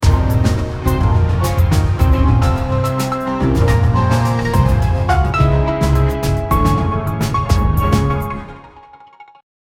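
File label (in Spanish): música corta de noticiero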